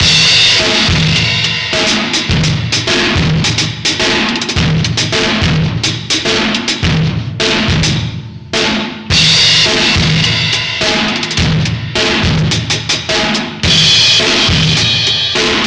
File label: distort beat